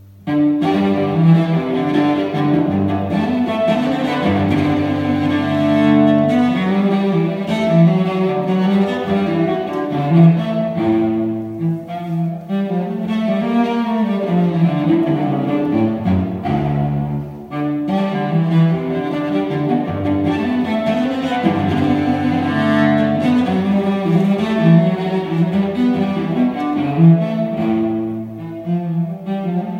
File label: Gigue; Bach